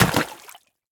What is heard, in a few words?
puddle splash